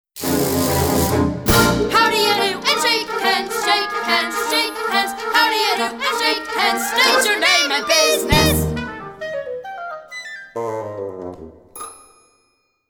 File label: Reprise Guided Vocal